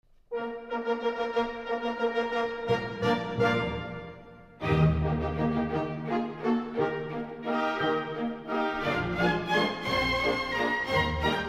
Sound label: Giselle Male Variation Act II